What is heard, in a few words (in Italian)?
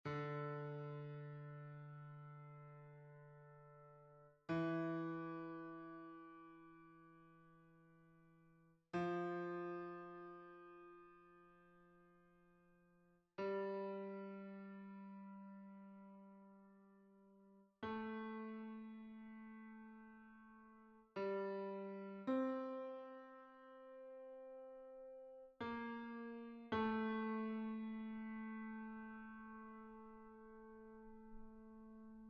tenore piano